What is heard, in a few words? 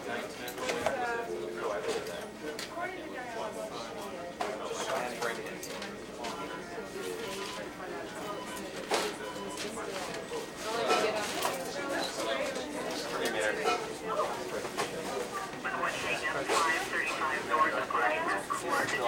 reception; Golden Crayon Sounds